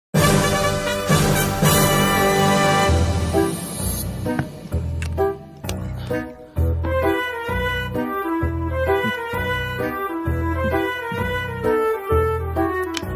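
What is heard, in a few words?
Teaser Instrumental Ringtone; Devadas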